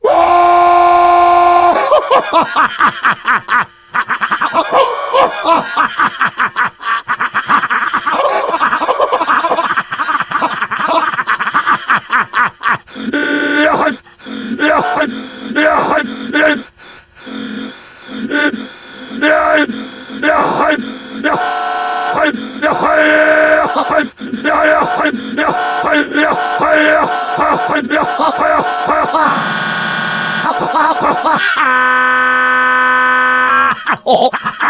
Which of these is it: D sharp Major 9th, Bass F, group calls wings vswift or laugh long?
laugh long